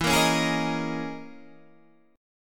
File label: C sharp Minor 6th Add 9th E